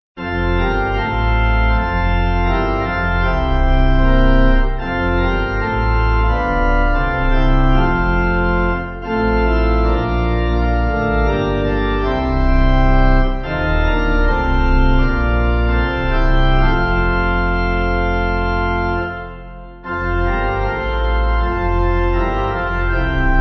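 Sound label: Pipe Organ, Music Backing Files for Churches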